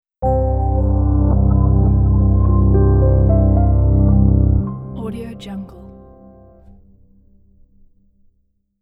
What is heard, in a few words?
harmonious piano logo